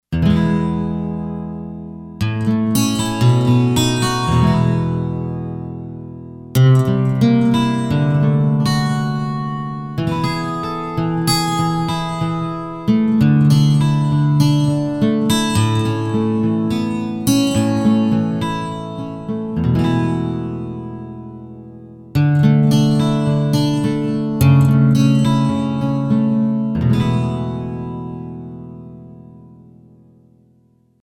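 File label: 3D Acoustic Guitar; Roland SC-880; Factory Patches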